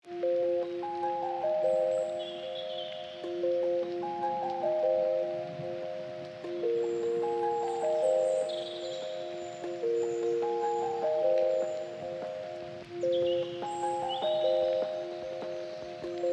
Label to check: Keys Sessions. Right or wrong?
Right